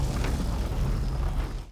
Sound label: sliding targets; XRI Starter Kit; Shopping UnityVR